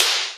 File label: sparkly steel snare drum sample b key